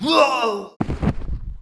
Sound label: DIE, Monster; Champion; 금전방; 철권투랑